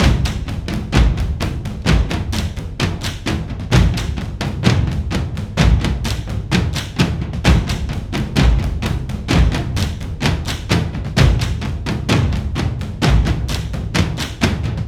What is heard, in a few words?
Drums; For Honor